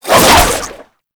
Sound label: Whip Spin Whoosh; Warframe; Oraxia Whip; Weapons Melee